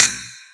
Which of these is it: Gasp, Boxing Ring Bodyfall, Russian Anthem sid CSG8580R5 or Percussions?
Percussions